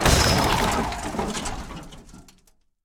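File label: ambience dirt out; Blind Drive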